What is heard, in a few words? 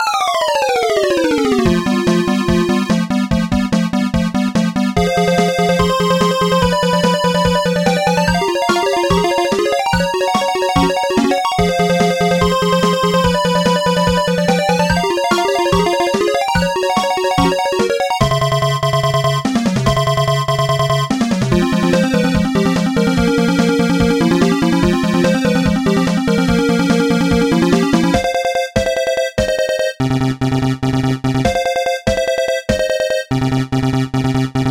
Battle Music